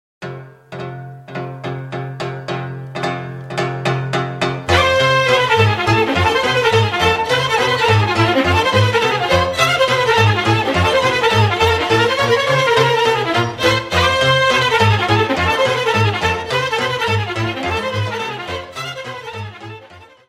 Sarba de Concert; Roumanie

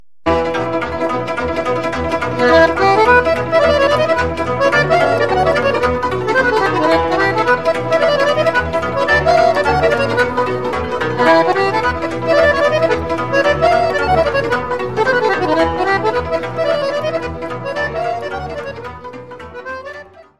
Sirba din Bacau, Roumanie

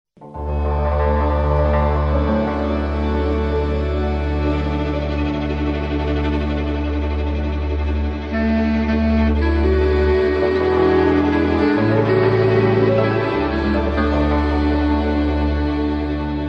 Suita de jocuri din Tara Zarandului - Roumanie

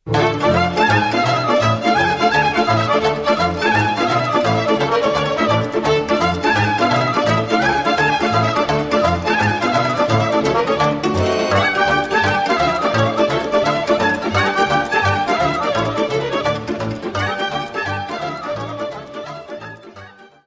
Sirba de la Chiricari, Roumanie

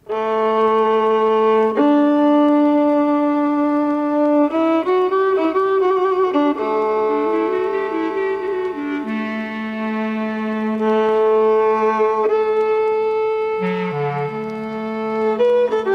Doina, Roumanie

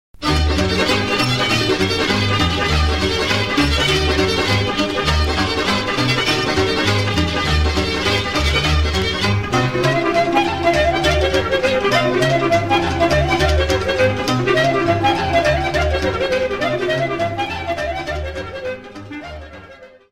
Sirba de la Negru Voda, Roumanie

